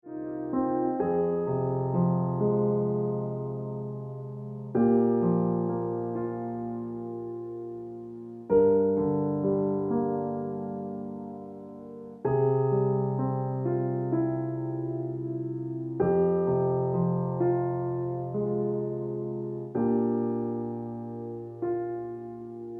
Ringtone

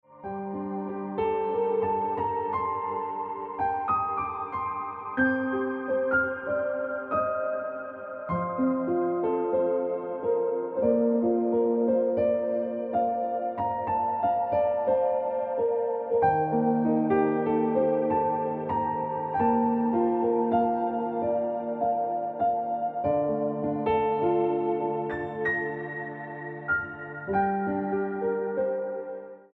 Ringtone Piano Music

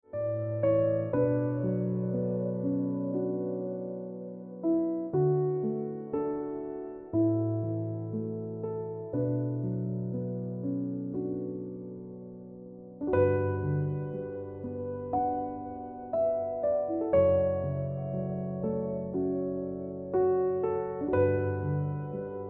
Piano Music

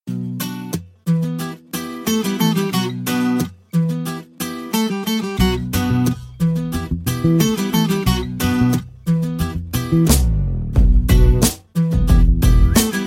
Music Turkey Ringtone

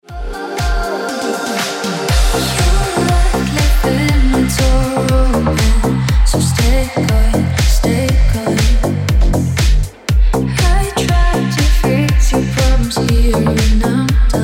Ringtone

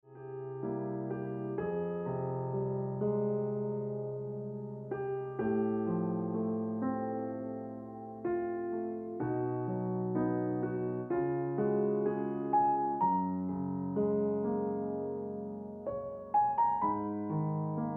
Piano Music

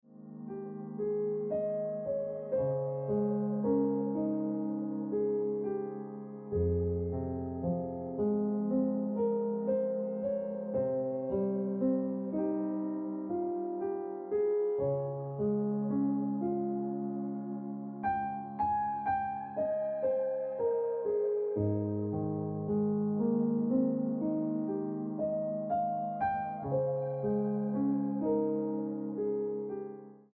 Ringtone Piano Music

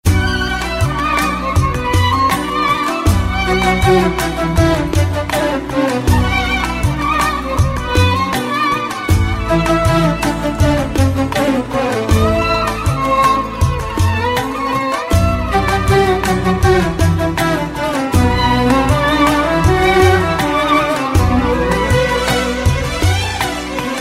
Turkish Arabesq Turkey Ringtone

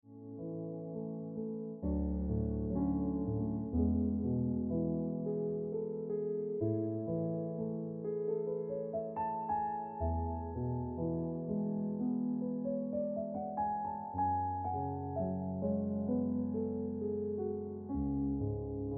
Ringtone Piano Music

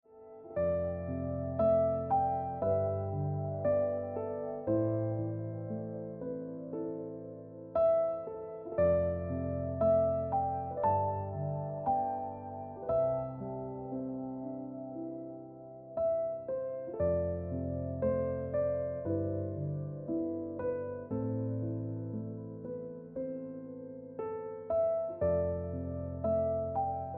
Ringtone Piano Music

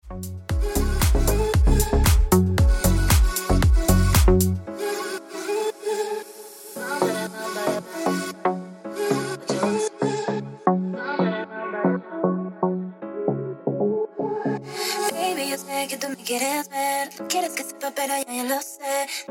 Ringtone

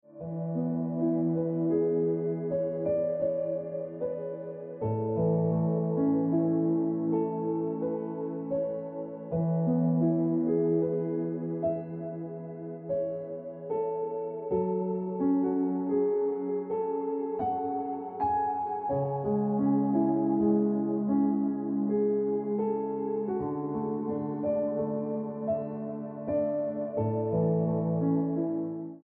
Piano Music

